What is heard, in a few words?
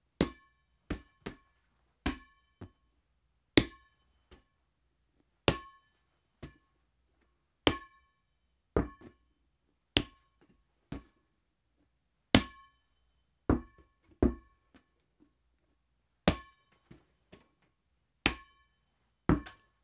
Rubber Ball Ringy Hits Catches Playground Style; Foley; Props; Foley Essentials